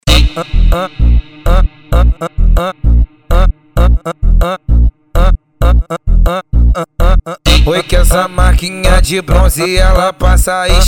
funk grave

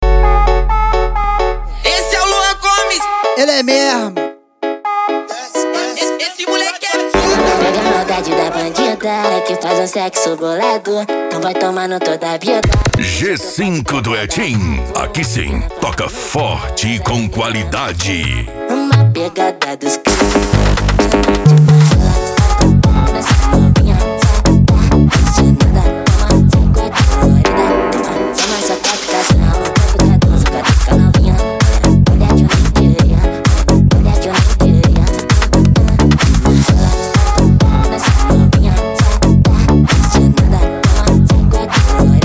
mega funk e funk mega bass

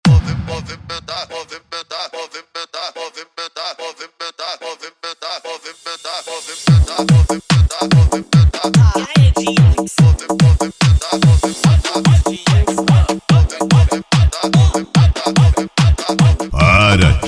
esp pancada

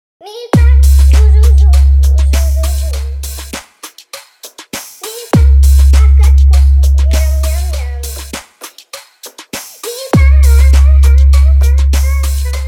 reggaeton; cd raiden alto falantes

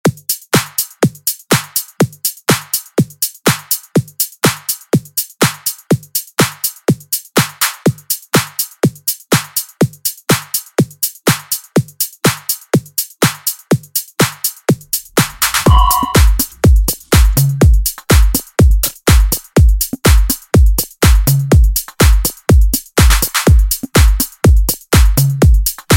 eletrofunk; som automotivo

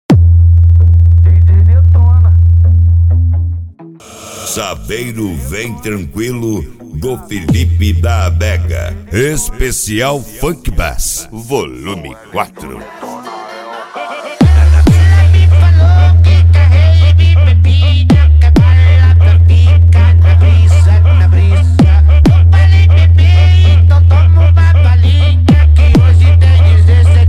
esp funk bass

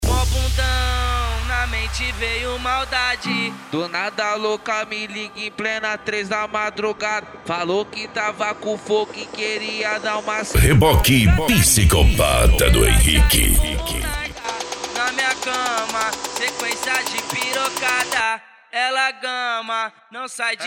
eletro funk

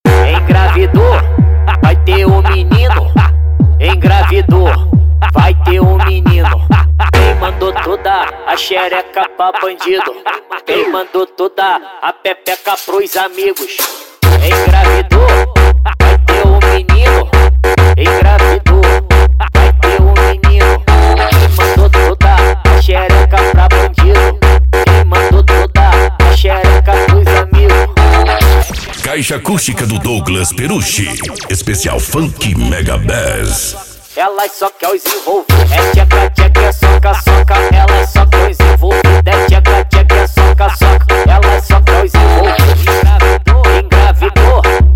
especial funk mega bass

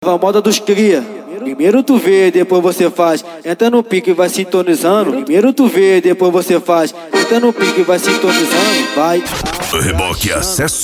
racha eletronica e funk mega bass

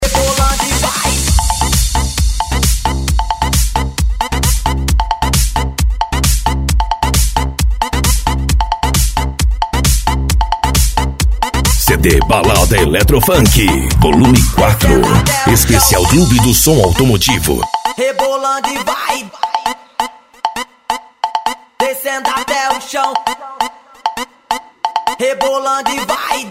eletro funk; cd balada eletro funk vol4

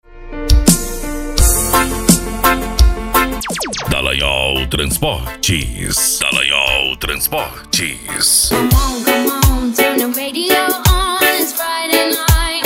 reggae; dall agnol transportes

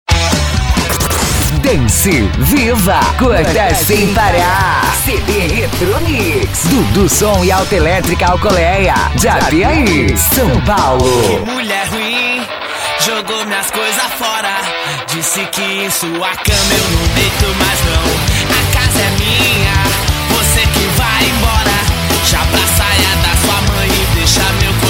rock nacional; cd retro mix

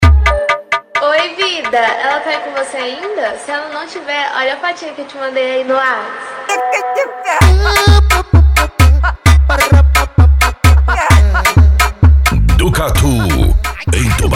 funk agressivo

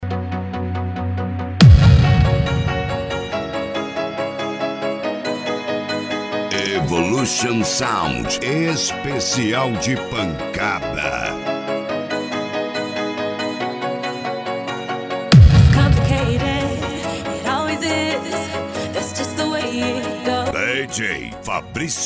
esp de pancada

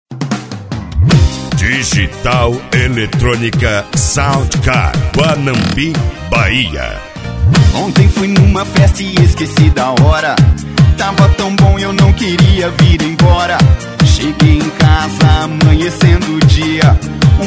pancanejo eletronica sound car; cd pancanejo digital

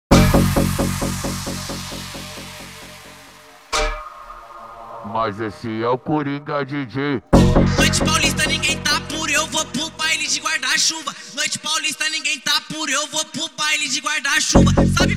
funk agressivo

